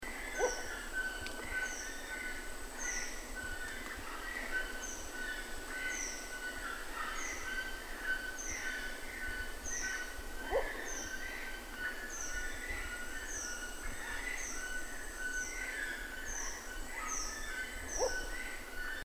Crimson fruitcrow; کلاغ میوه خوار زرشکی

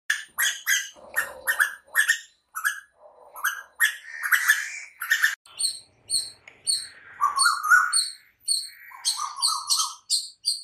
bird sound cuckoo

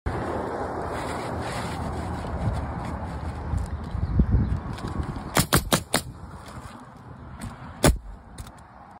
nail gun; میخکوب

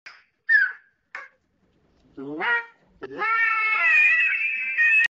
Kākāpō

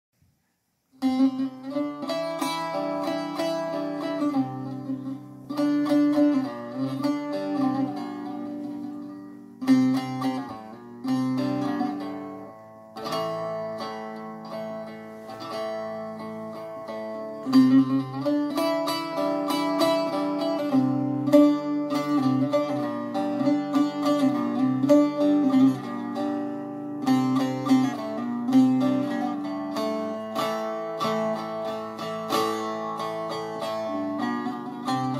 baglama; ساز باغلاما

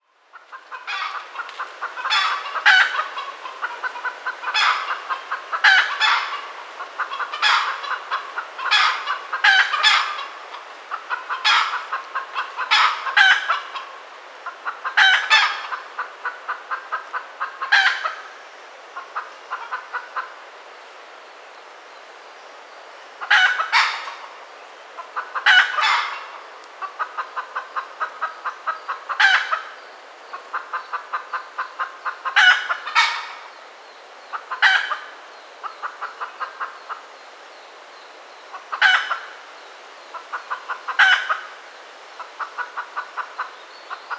Red junglefowl; مرغ جنگلی سرخ

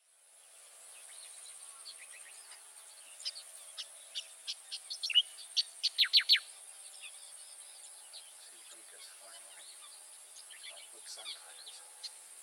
Striated grassbird

